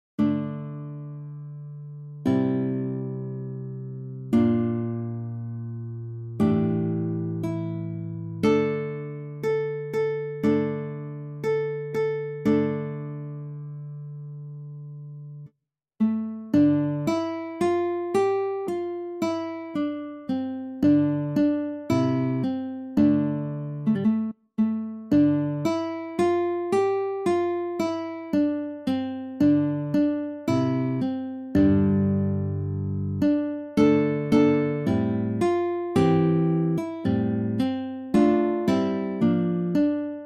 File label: the huron carol guitar; christmas music and carols